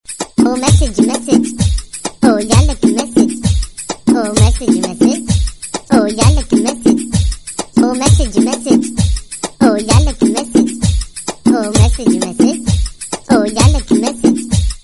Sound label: oo message message funny tone ringtone, sms ringtones